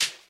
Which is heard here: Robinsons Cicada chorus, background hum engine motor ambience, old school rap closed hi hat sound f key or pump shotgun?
old school rap closed hi hat sound f key